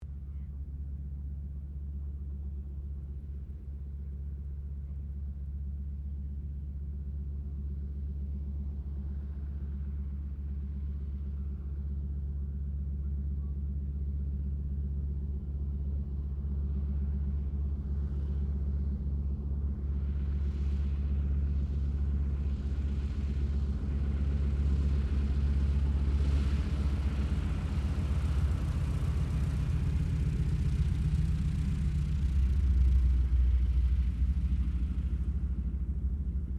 AIRCRAFT AT-6D HARVARD MK III TAXI DISTANT COMMS STEREO; Temora Harvard MK III; Airplane Sound Effects